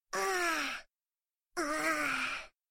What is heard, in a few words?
uaahhh